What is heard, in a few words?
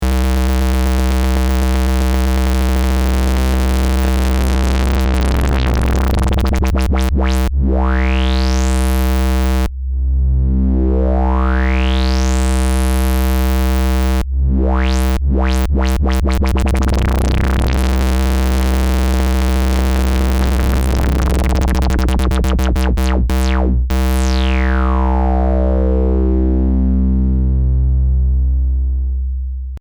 Cycle Mode